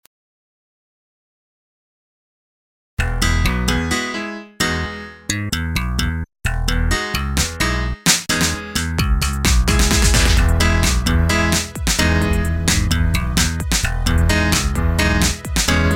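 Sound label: E major piano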